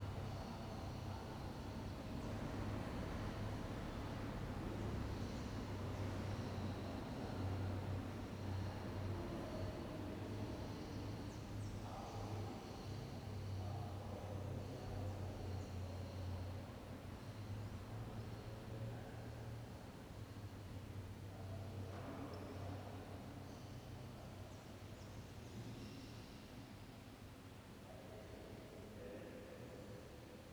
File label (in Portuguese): Ambiencia igreja com passaros e pessoas ao longe; colecao sonora do cerrado